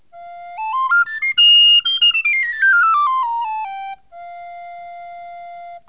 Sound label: recorder sopranino range